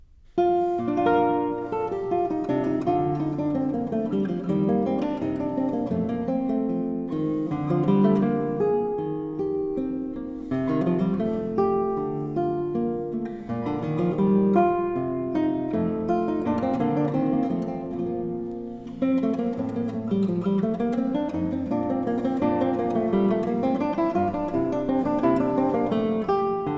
Cello Suite No 4 in E Flat Major, guitar, Allemande; Bach Transcriptions and Arrangements for Guitar